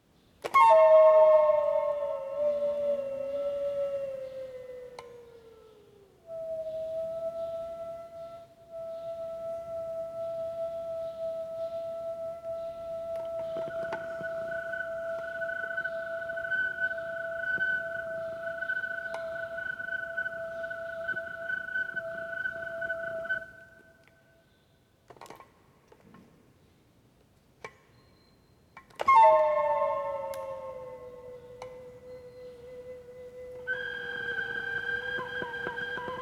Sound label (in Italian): organo